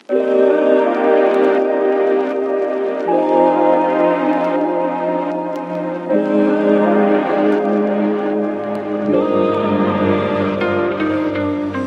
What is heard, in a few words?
Lo Fi